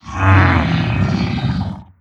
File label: dry, strider idle; creatures; combat; vox